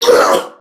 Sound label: Pain Crewmen; Warframe; CorpusCrewmen; CrewmanB